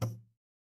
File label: cartoon bonk